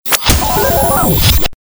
Galery Noise; Sound Ideas - Galery Noise 001

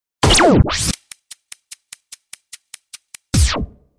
ticky

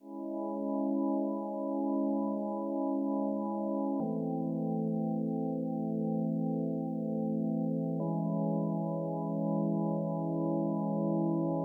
Appearence of a powerful charactor, organs

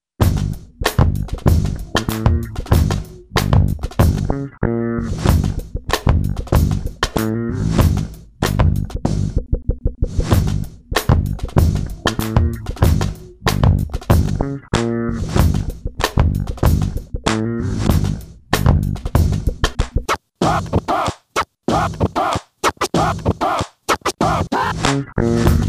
funkified, bed

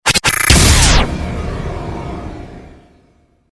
Galery Noise; Sound Ideas - Galery Noise 001

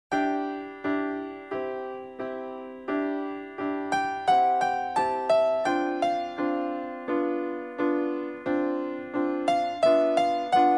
Easy Listening & Classical; Sound Ideas

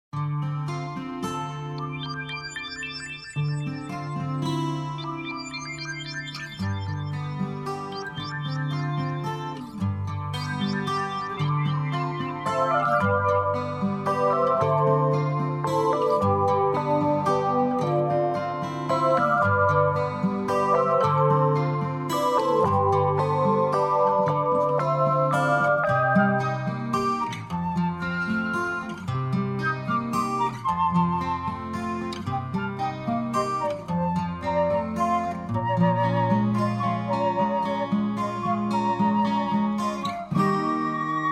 Easy Listening & Classical; Sound Ideas

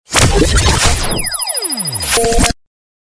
Galery Noise; Sound Ideas - Galery Noise 002

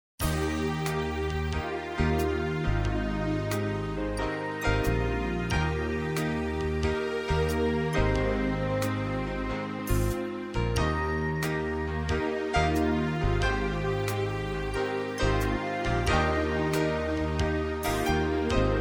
Easy Listening & Classical; Sound Ideas

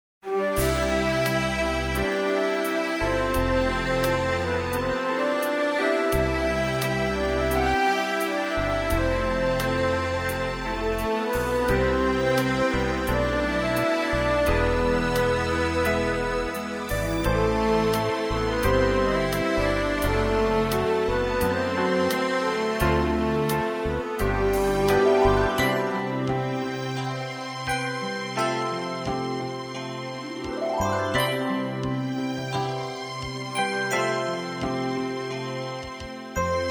Easy Listening & Classical; Sound Ideas

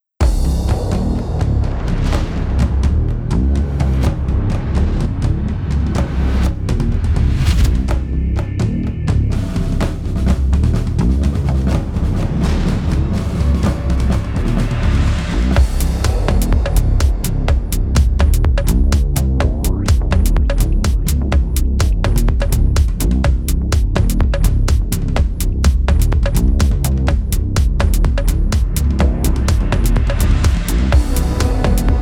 NEWS BEDS

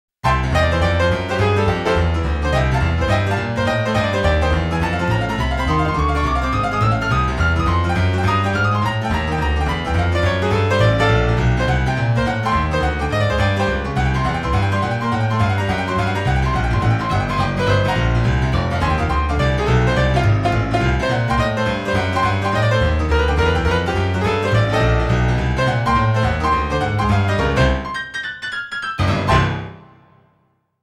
Comedy Style; Sound Ideas - Comedy Styles

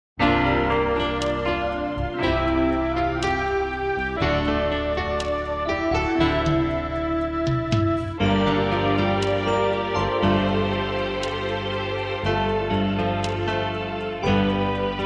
Easy Listening & Classical; Sound Ideas

